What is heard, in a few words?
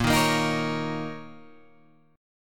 Bb Minor Double Flat 5th Bb, Guitar, Open G